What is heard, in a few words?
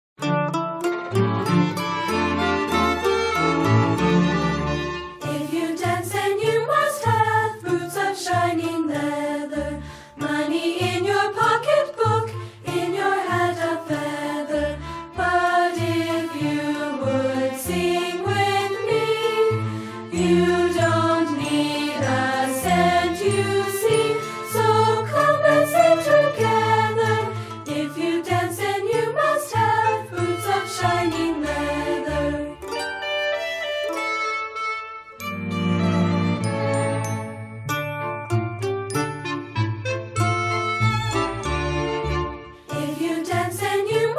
Come and Sing Together unison